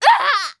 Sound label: Mage Death Shout